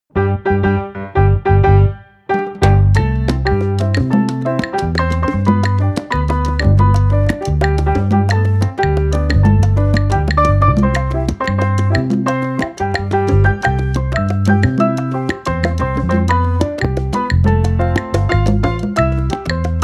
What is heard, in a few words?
happy birthday mambo ringtone